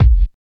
warm sounding bass drum single hit a sharp key, Royality free steel kick drum sound